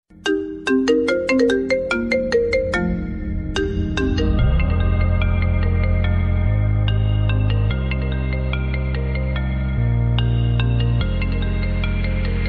iPhone 15 Pro Max Blaze

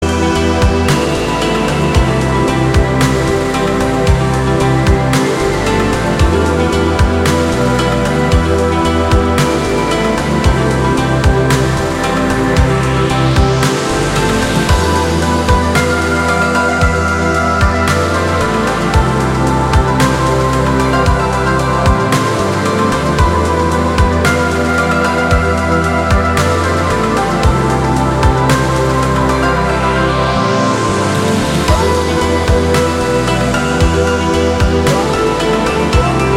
Spooky Flute

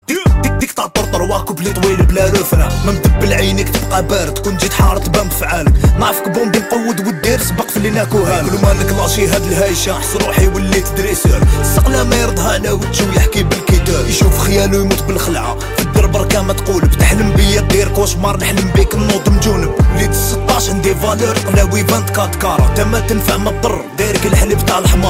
Trap

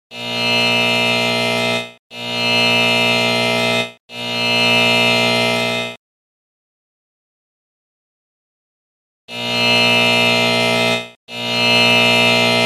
Clock Alert; iPhone11

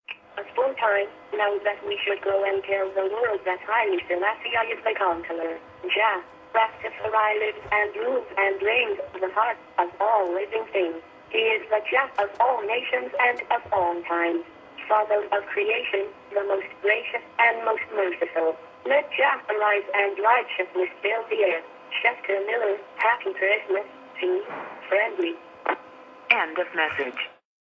Rasta Message

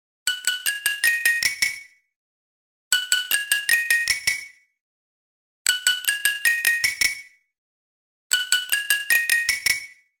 Samsung Galaxy F34

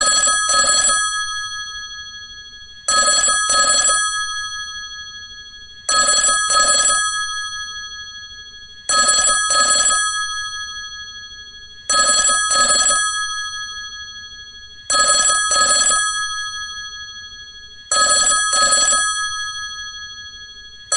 Timbre

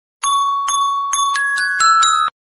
Vivo Sms

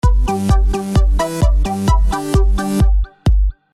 Electro

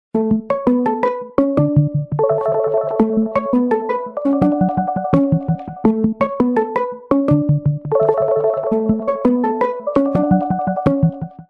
Nokia 2780 Flip

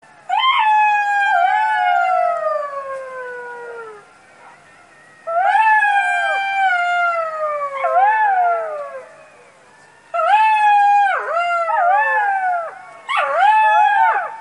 Dog

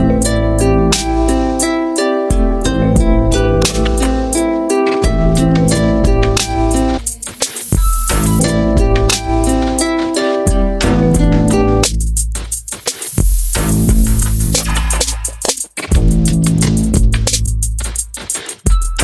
Samsung Remix Tune

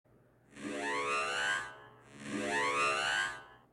Black Alert, sonnerie

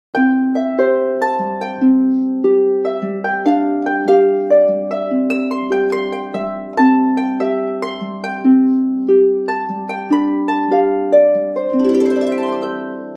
Samsung Harp Tone

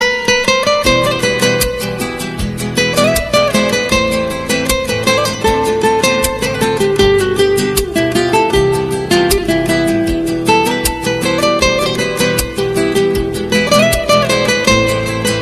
Relaxing Guitar Tune

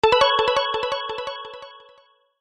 Samsung S24 Ultra SMS

